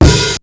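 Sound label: bass drum + hi-hat; 300 Drum Machines; Yamaha Motif ES-Series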